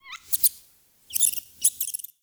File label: Witch Station Rat Chatter; Ambience; Soul Plane